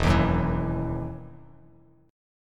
E Major 7th Suspended 2nd E down Bass Standard; strummed; JGuitar

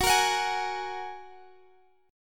F sharp Major Add 9th; F#add9 strummed; x,21,20,23,21,x

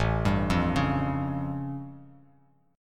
A Augmented 7th A Bass Standard; arpeggiated; JGuitar

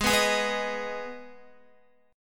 Ab Minor Ab down Guitar; Abm strummed; JGuitar

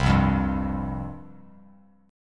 C Suspended 4th C, Bass Standard; Csus4 strummed; 8,3,3,0; JGuitar

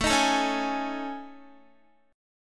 A Major 7th Flat 5th, Guitar; AM7b5; strummed; x,12,13,14,13,15

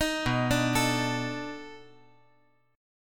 B 7th Sharp 9th, down

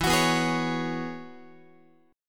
E 6th Add 9th E, Guitar; strummed; chord

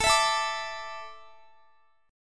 A Suspended 4th, Guitar; Asus4; strummed; x,x,19,21,21,21; 4E,4A,5D,5G,5C,6F

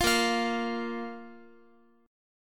Bb 5th, Guitar; 4E,4A,5D,5G,5C,6F; Bb5 strummed; x,x,x,10,10,12; JGuitar

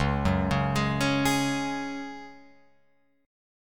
Db Suspended 4th, Guitar, Open F; 1,1,1,3,4,3; arpeggiated

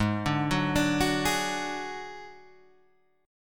F sharp Suspended 2nd Sharp 5th

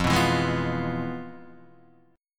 F Minor Major 7th Flat 5th Guitar Open D

